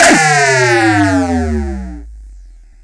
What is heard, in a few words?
DROOPER; E-MU Formula 4000 Series Vol. 2; Effectors